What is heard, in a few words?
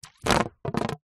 blow air into tube thin water gurgle dribble short burst; foley